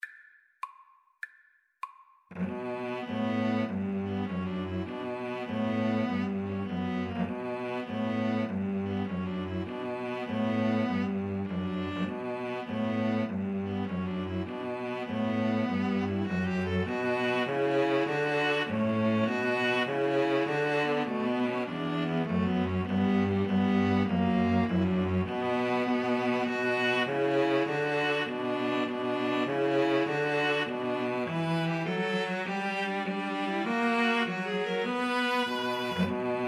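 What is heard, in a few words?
masters in hall, string quartet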